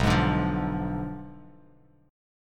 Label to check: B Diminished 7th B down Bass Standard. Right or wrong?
Right